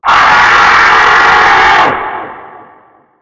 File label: jumpscare sound; fraf 2